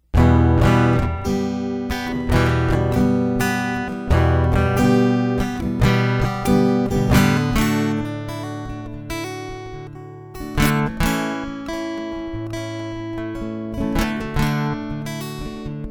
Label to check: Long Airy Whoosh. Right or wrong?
Wrong